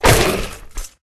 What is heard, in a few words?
Rat wound